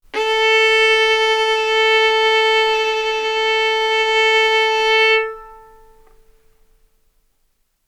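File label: Violin arco mf A string A4 B4 stereo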